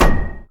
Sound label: anvil land